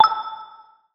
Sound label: airdrop ping; pings